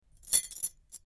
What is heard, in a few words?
Keys jingle